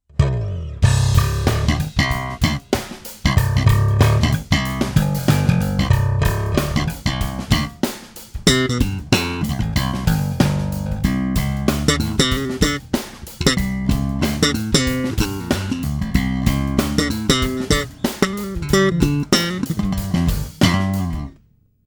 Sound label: slp act, bass